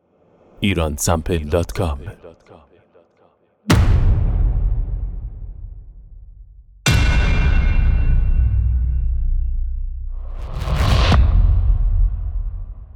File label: Cinematic Hits